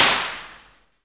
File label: Aluminum Wall, FX Perc; Rhythm Section